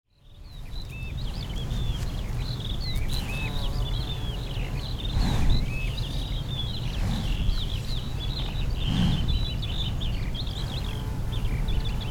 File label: the gardener nature sounds